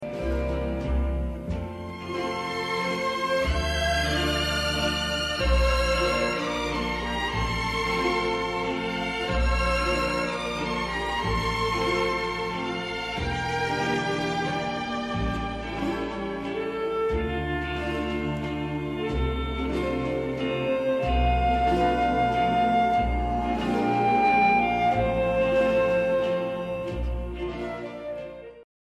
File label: waltz